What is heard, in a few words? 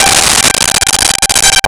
GM Piano G5; Nintendo; July 2020 Gigaleak